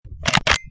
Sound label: chamber bullet; citygamin